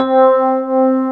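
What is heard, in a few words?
C4 electric piano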